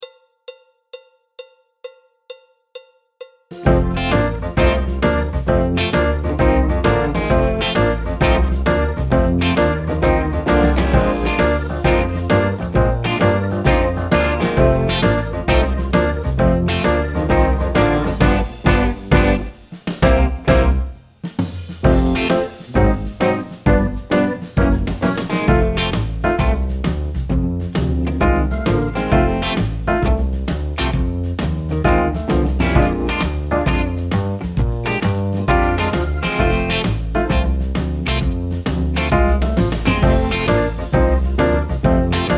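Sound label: backing track, Jazz; alto, tenor, trumpet, trombone; Jazz Syllabus Level 1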